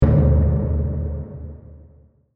Rhythm Dark Earthshade; manifest terra

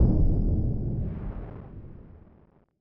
Rhythm Broken Apart; ignite

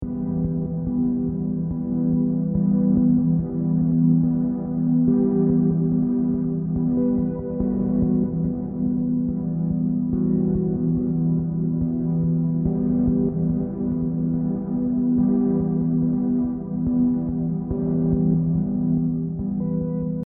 Sequence Vesta And Ceres Emin 95; manifest terra

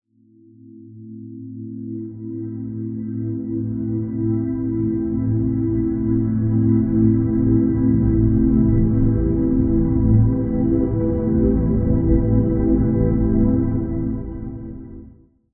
Pad 70 Class D Am

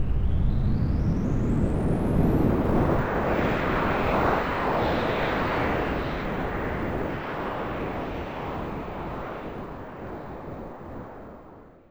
FX Astral Riser; living air

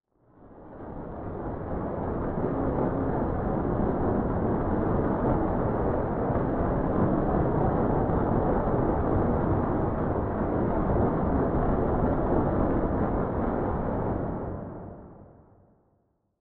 FX Surface Tremors; manifest terra

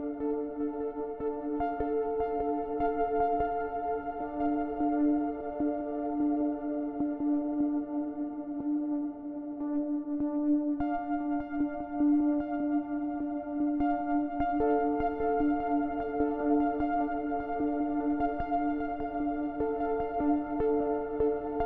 Sequence Dreamfyre Dm 75; ignite

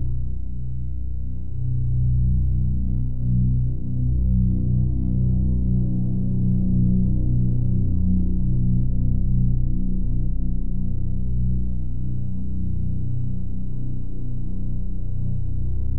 Bass Crater B 120; ignite

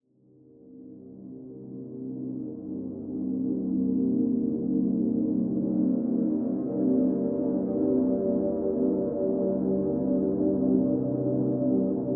Atmosphere Celestial Drift Cm; living air

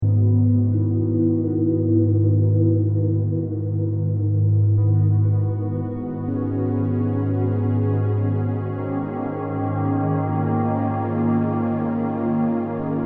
Atmosphere Material Capacity Emin 95; manifest terra

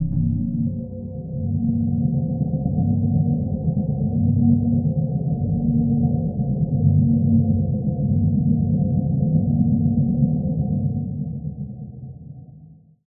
Drone 90 Polar Winds C0

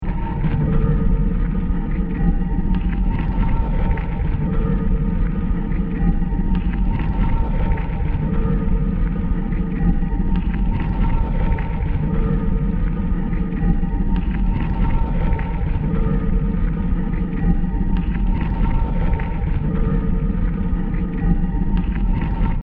Texture Moving Ground 85; manifest terra

